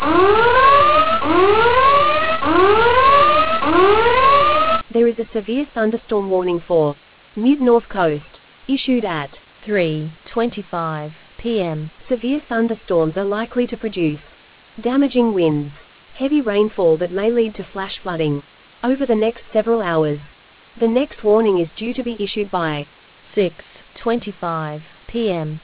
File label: storm audio